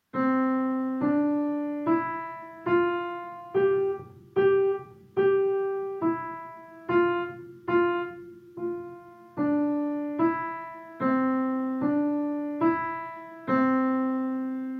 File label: walking note tune